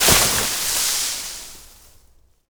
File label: medium bush impact